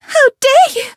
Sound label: Voice damaged; hoozuki